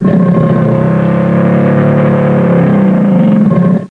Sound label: Growl A Monster